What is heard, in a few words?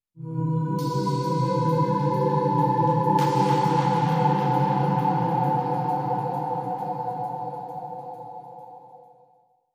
Percussive Sting